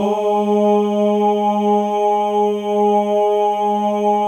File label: MALE OHS; Spectrasonics; Symphony of Voices